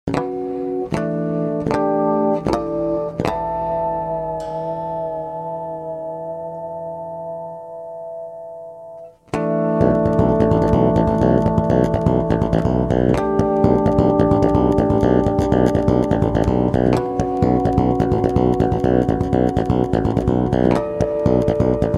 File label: BASS NO DRUMS